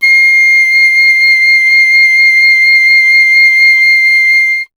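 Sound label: flute piccolo; Miroslav Vitous - Solo Instruments VOL-2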